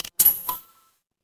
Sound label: ui app open